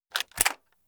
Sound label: rifle reload